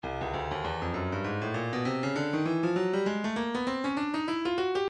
stylus breakbeat midi 099 bpm playful

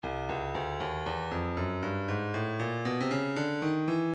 stylus breakbeat midi 117 bpm proghouse

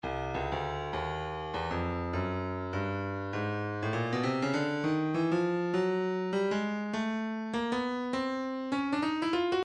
stylus percussion midi files cabasa 100 cabasa

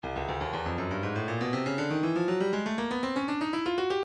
breakbeat midi files 120 121 bpm 120 club happy; Stylus Breakbeat Midi Files

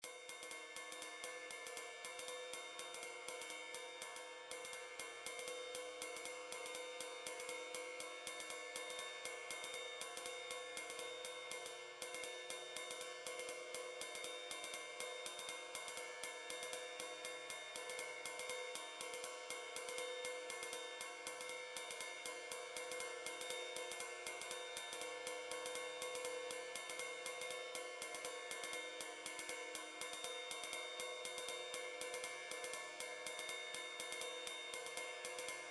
midi drum patterns drums mix it up

